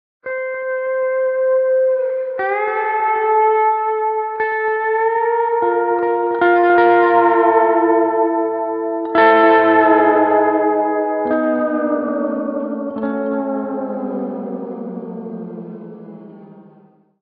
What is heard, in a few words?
G steel